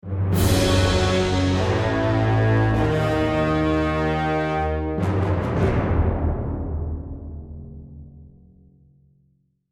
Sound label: deathcam; music